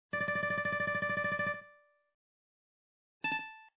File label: Melody